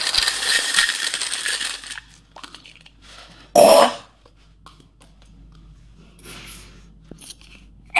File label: toilet